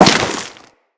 Crewman Step Dirt, Footsteps; Corpus

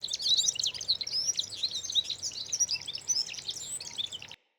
Wetland Forest Day Bird Close; Midrath Island; Ambience

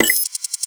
Moa Data Pad Press; Characters; Warframe; The New War; Veso

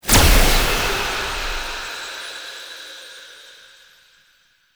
Dissolve Smoke; Warframe; Mods; Peculiar Mod

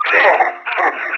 Enemy Pain; Warframe; Lasrian; Battle Barks

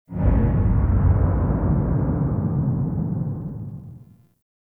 Big Hit; Ambience; Warframe; Nokko Colony

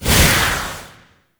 Light Beam End; Warframe; Eximus; Jade Light

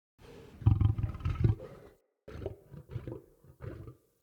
Wolf Growl Relaxed Jog; Animals; Movement

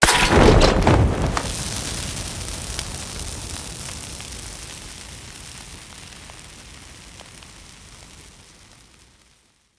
Castle Siege Flag Snap Burn; Encounters

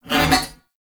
Ram Idle Grunt; Warframe; Duviri

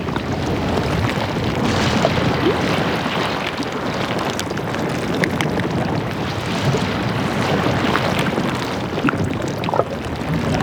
Molten Metal Loop; Props; Warframe; Tau; Ambience

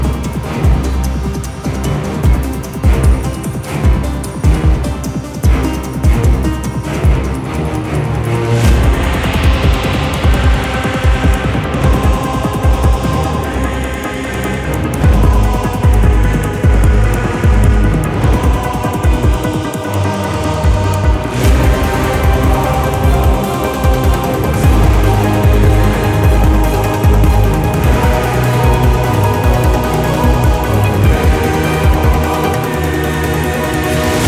Race Music; K Drive Races; Deimos

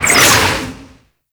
Cannon Projectile Whistle; Pirate; Warframe

